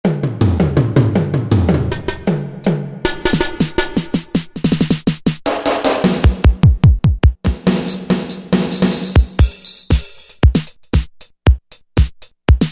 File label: Korg T3 Drums